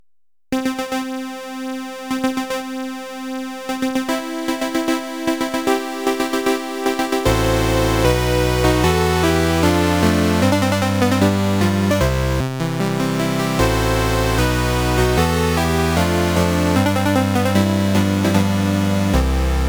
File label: Wedding March; Namco Graffiti 1; LIBBLE RABBLE BRIDAL; Mendelssohn